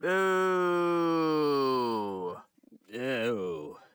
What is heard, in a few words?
Man Booing; Zomboid; Lifestyle